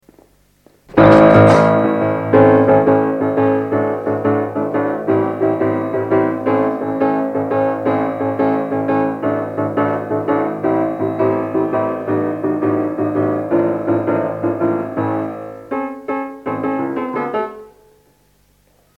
Improvisation

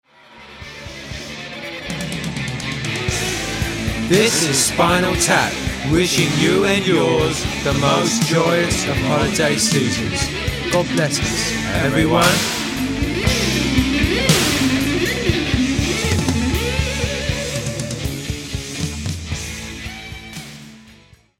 Jingle

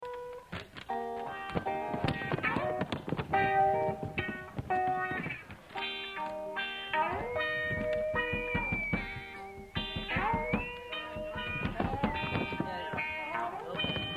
Improvisation

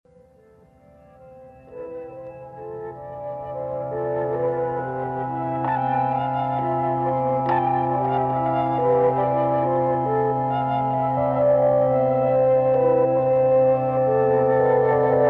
Mellotron Music; Film Version

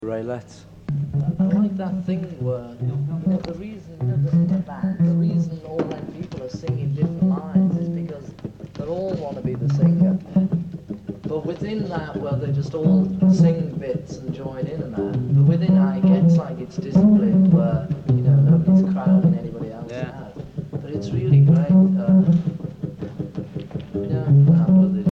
Improvisation

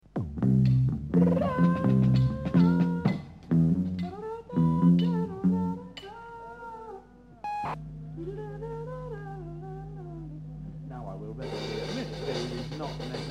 (Gospel Instrumental)

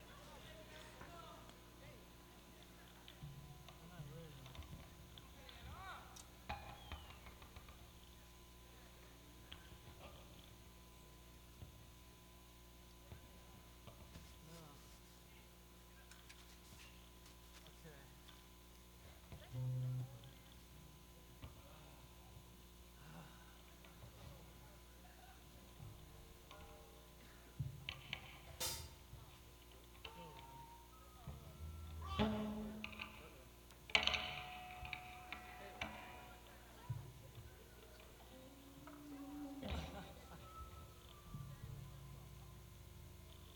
Tuning

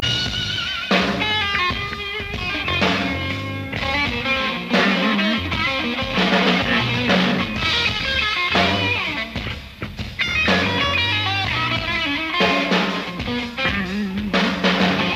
Unreleased Jam

